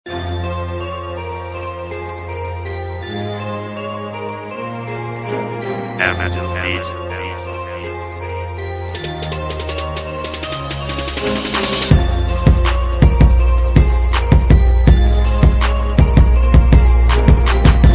Gangsta Rap

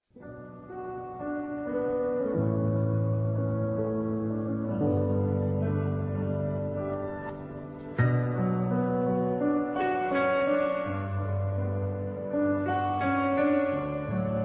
karaoke instrumental

